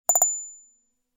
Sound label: rattle sms ringtone